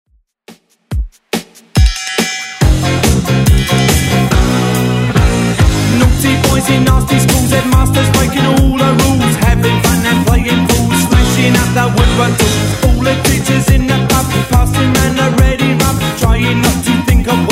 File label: 80s Redrum, 141 bpm